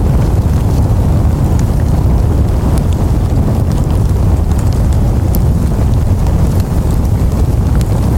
flamethrower loop

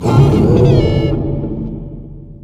charge up; satan

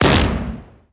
brown nugget spawn

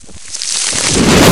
reverse explosion

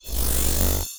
light bolt delayed

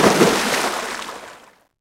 boss dive

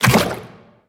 little jump; blastocyst

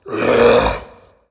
knight growl

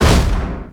dark arts activate

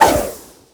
sword spin

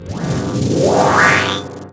power up

